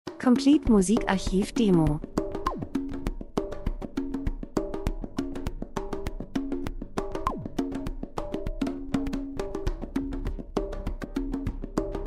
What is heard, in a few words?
drums; Variation Modern Percussion Afrika Traditionelle Trommeln Sounddesign Land und Leute Buschmann Reisefilm Natur Dokumentation